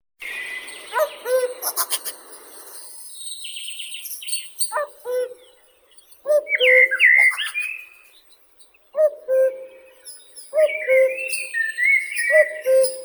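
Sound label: common cuckoo, Cuculus canorus; kukushka obiknovennaya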